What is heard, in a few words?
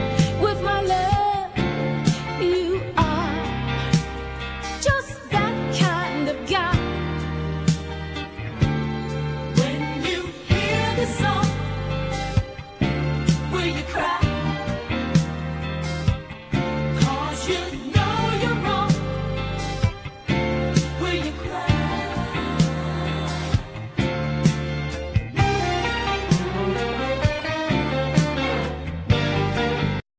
cry